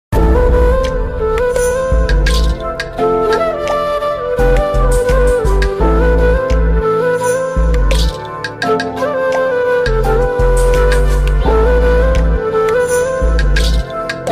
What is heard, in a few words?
flute version ringtone love ringtones